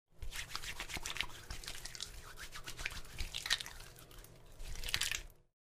washing hands; casa

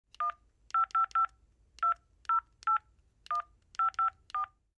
phone dial

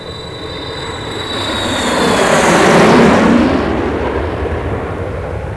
JETS; trasporti; aerei

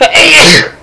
SNEEZING

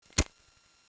lighter

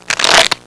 DEALING

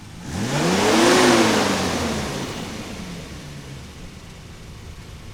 IDLE, auto; trasporti

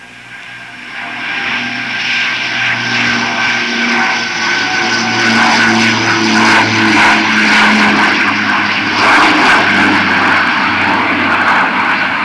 F-15 OVR, Aerei; trasporti

